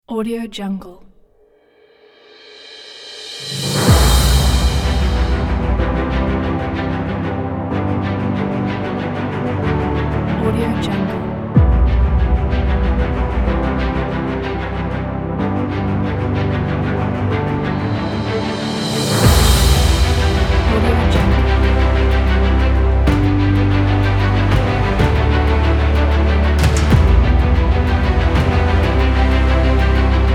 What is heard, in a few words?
epic cinematic dramatic kit